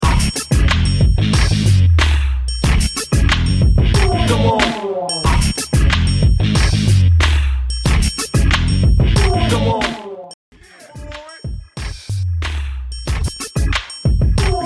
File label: 3 songs into 1